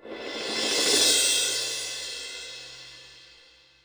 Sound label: Akai XR-20, Drum Machines, Cymbals